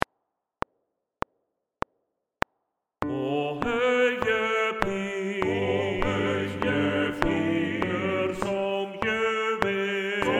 TUTTI MED COMP

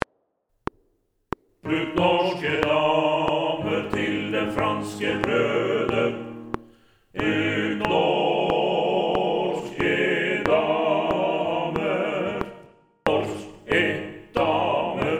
TUTTI - uten solist

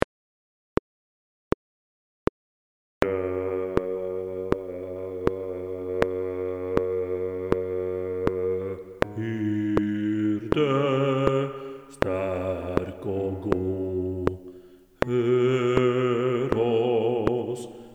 lydspor Bass 2 CLICK

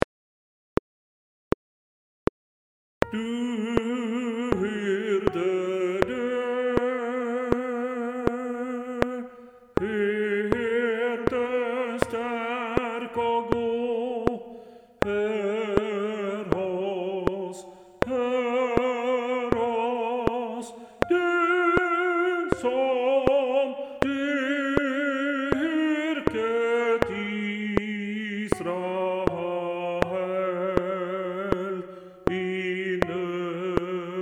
lydspor Tenor click

